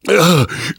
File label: choke